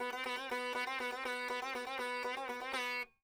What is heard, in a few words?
sitar